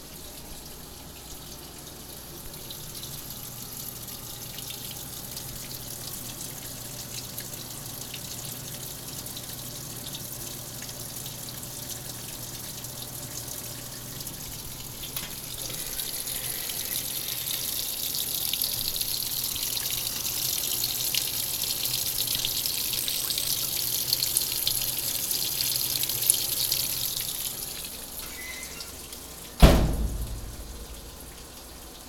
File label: Laundry - Rinse, binaural